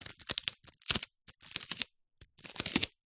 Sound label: office sticky tape strip handling fingers; zapsplat